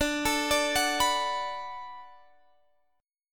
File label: D 6th D down Guitar Drop D, chord